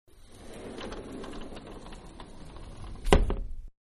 Sliding Door Close; Miscellaneous Doors; Manhattan Production Music

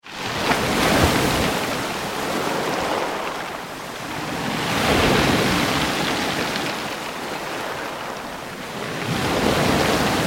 Ocean Sea Medium Surf onto Pebble Beach; Close waves and pebbles; Ocean Sound Effects

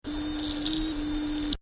Toy, Small: Robot Move Effect; Miscellaneous Toy

